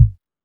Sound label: Techno F, Drum Machines, EMU XL-7